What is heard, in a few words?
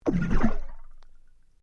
Underwater Bubbles Bucket Burst Short, Air released from under a submerged bucket